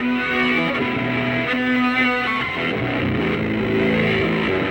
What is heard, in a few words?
Peeldown, Electric Guitar; Sonic Foundry (Sony Creative Software); Friendly Fire; Serpent